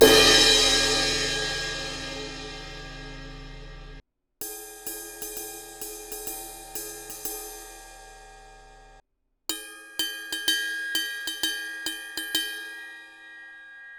meinl 19 inch mb8 medium crash crash ride bell

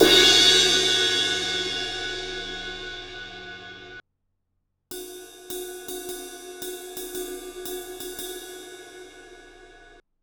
sabian 19 inch hhx x plosion crash ride bell

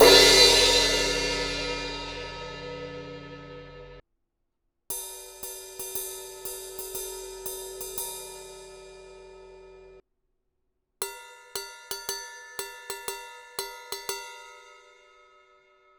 sabian 18 inch b8 pro medium crash ride bell

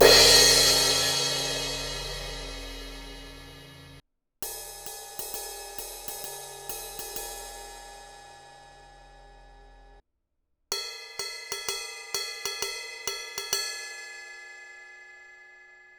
paiste 18 inch crash crash ride bell

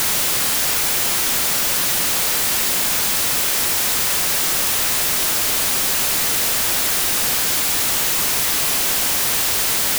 white noise minus 50 db

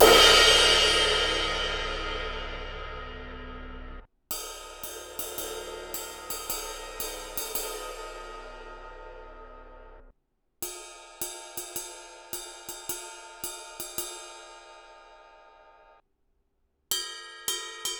22 inch aax omni crash outer ride inner ride bell; Sabian

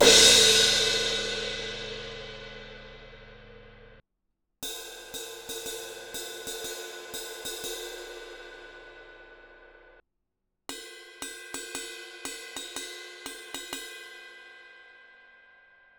sabian 18 inch hhx legacy crash ride bell; these are 24 bit, 176.4 kHz wav files

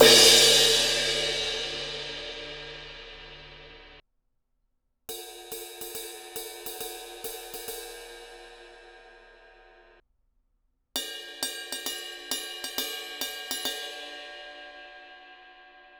18 inch universal crash crash ride bell; supernatural

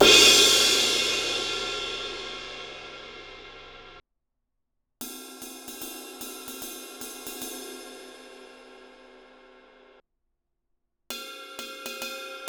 20 inch bliss crash ride crash ride bell; dream

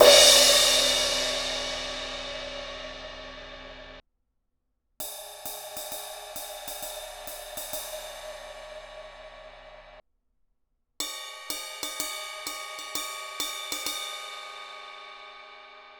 supernatural 18 inch divine crash crash ride bell

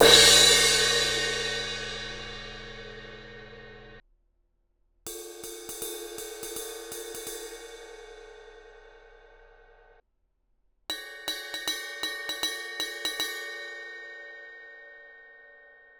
18 inch 602 thin crash crash ride bell; paiste

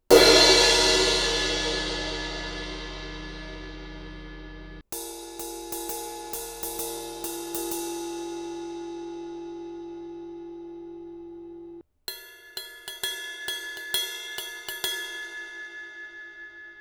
paiste 18 inch rude thin crash crash ride bell

